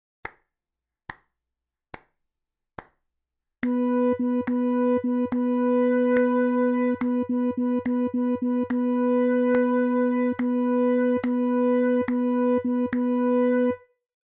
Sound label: Rhythm Practice